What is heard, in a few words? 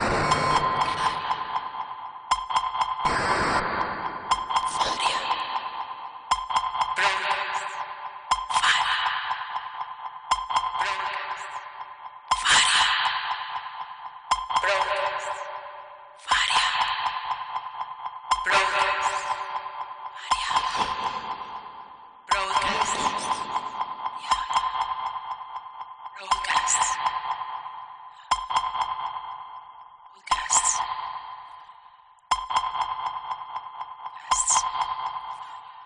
jingle